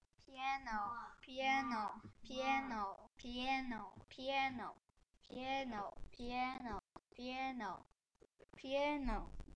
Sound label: piano